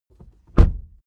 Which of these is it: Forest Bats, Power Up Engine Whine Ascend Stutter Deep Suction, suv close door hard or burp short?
suv close door hard